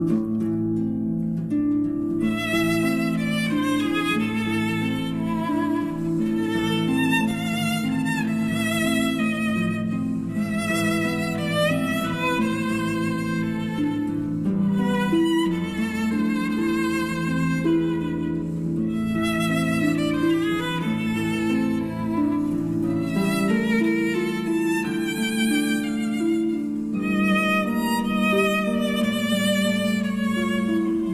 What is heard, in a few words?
Berceuse, for violin and harp